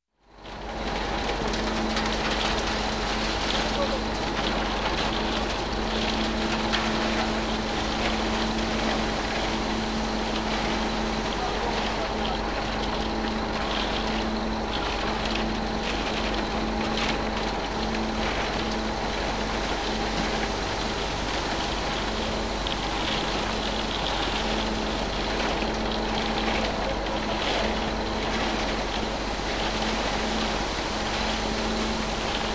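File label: concrete mixer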